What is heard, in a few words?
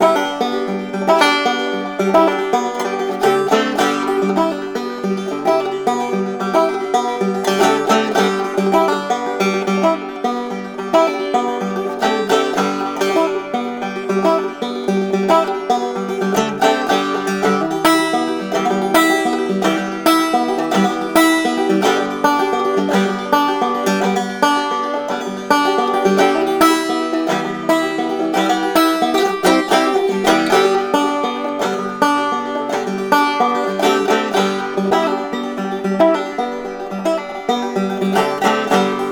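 music ride banjo mono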